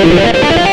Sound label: xtra lead